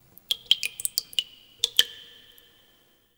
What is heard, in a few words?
Terrarium Drips; Ambience; Warframe; Entrati